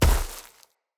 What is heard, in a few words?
Step Veg, Footsteps; Enemy; Corrupted Sproutfolk